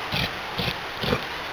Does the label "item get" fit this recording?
No